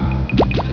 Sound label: pop